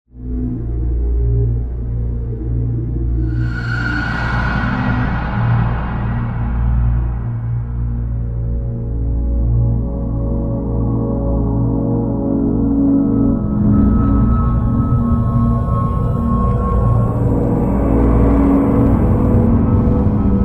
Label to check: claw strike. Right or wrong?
Wrong